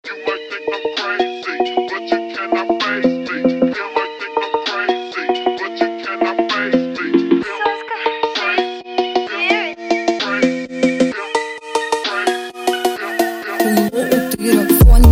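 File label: phonk drift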